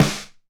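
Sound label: Snare Drum Acoustic Big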